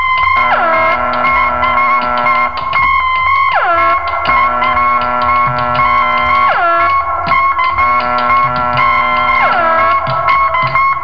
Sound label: SCIFI